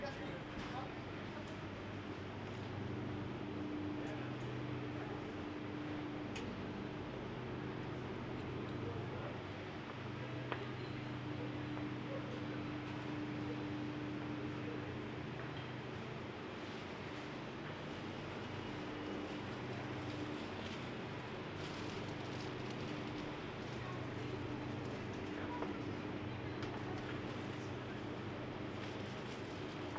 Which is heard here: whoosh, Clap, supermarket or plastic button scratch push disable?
supermarket